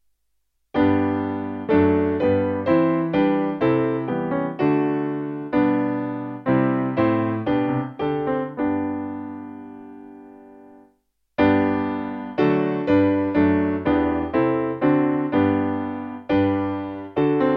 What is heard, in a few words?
Piano